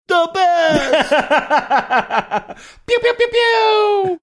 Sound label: saying da best ray gun sounds